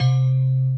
Heavy Bell

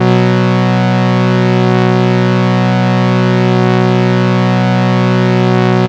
raw synth 5th mid passed; instrumente

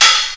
Mid open, HATs; percussion; SamplePool3

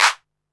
clap, percussion

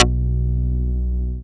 Great Bass

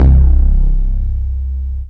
Boosted, baesse

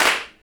Clap double, percussion

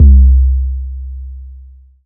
boom

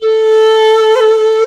Goa Pipe; instrumente

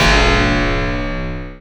Beat, baesse

